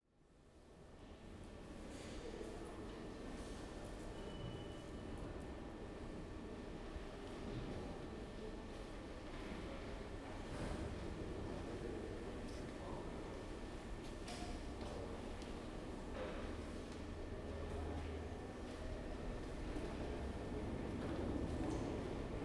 underground dock metro, FRENCH SUBWAYS; Chupapsound

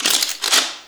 WEAPON CLICK Reload Mechanism; GameBurp; 2000 Game SFX

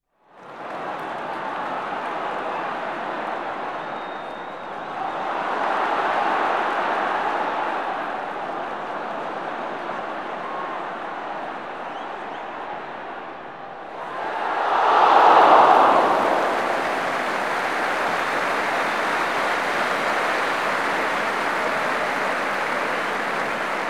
Football Crowd - Near Miss And Ap; Crowds; BBC Sound Effects Library

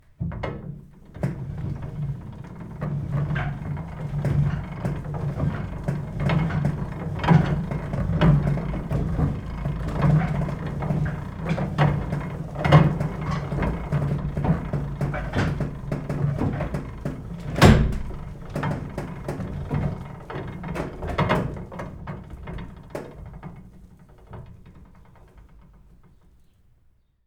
Crank Handle Full Run, Laundry Mangles; Detunized

